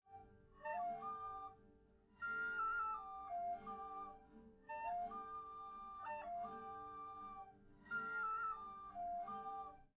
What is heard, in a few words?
nodrums liverec 250ms 5cm